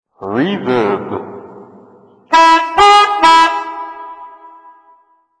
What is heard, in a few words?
reverb, harmonica